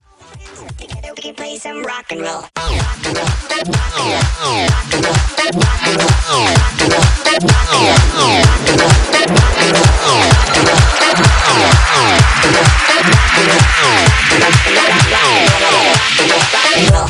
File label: buildup; TF2Maps; chillskies; wubwubwub